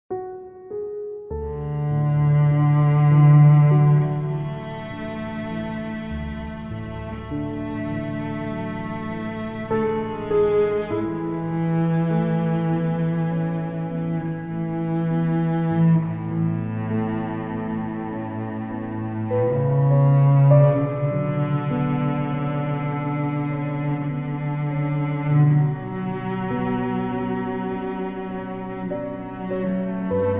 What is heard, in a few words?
serenity and calm instrumental, violin cello; Relaxing Music